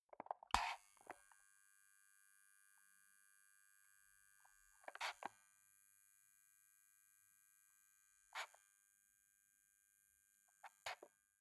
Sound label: foot switch dimmer slide power decrease